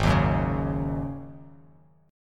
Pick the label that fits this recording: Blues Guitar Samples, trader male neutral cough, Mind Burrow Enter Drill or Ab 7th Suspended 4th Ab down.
Ab 7th Suspended 4th Ab down